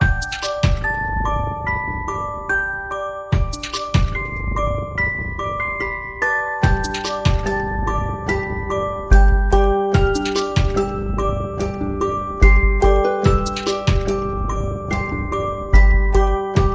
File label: Angelic Lullaby